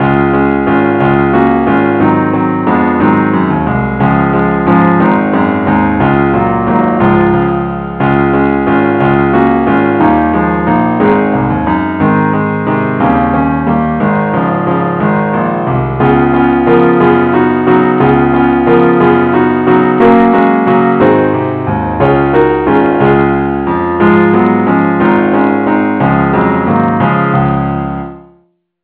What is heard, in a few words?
angel voices keyboard only key des